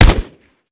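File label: akilo47 fire player, weapons; viper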